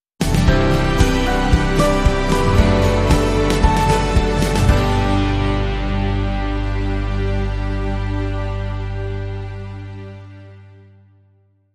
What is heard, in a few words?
Sting No Electric Guitar